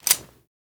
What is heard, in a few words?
revolver shut cylinder; Rust